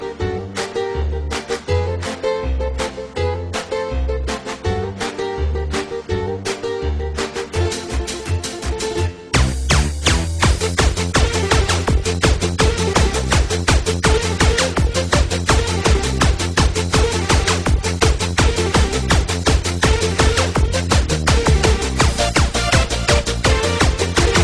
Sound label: Sirtaki Techno